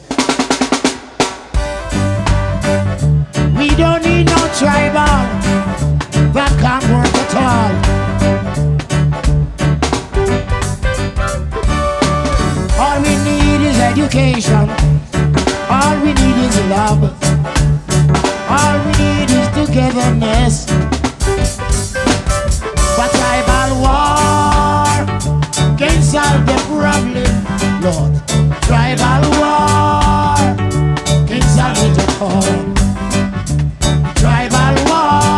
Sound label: Live in Africa